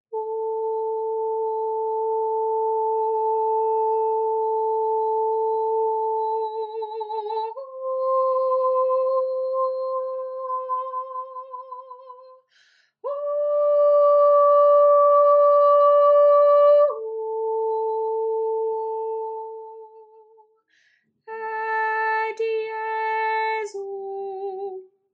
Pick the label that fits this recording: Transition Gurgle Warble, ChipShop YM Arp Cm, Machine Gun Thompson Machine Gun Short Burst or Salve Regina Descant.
Salve Regina Descant